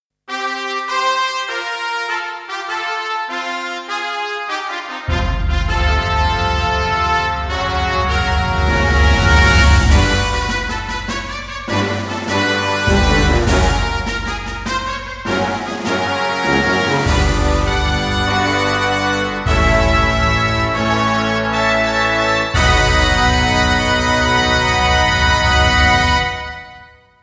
Fanfare - Far East Racecourse

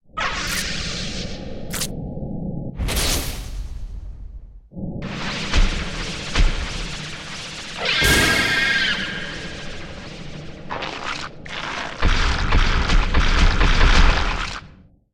Appear

